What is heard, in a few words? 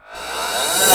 REVERSCYM, CYMBALS; USB Soundscan vol.20 - Fresh Disco House I